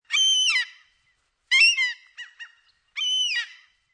Seagull Call Three Times, Single sea gull cawing three times with another seagull further away; Birds; Lynne Music